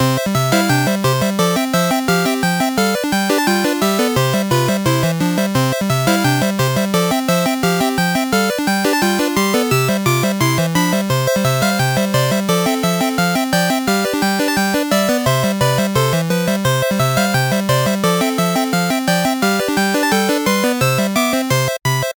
Thanks Music; Bebop and Tempo

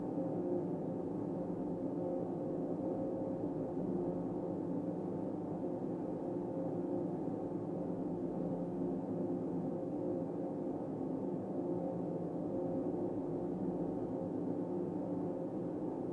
light machine vent

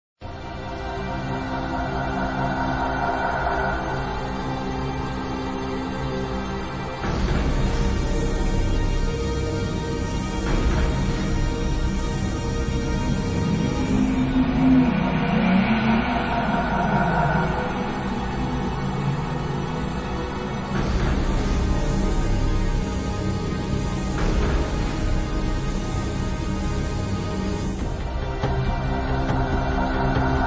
The Gauntlet (Ambient); Disruptor

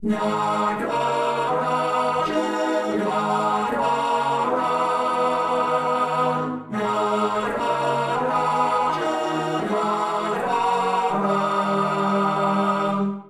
choir loop; stone story rpg; nagaraja

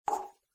knock; One Night Hot Springs

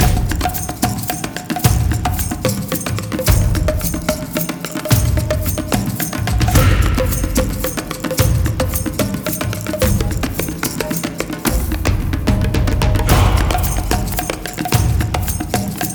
Garden Main LOOP

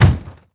plastic hit; teardown; ps5 windows xbox series xs

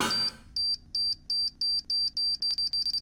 tools timebomb

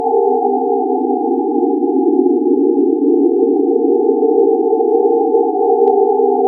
Enemy Proximity Drone

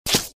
Sfx Assassin Attack Hit; Mighty Knight 2

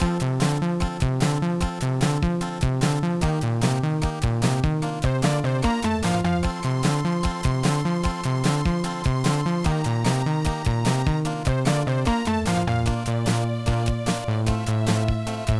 BGM

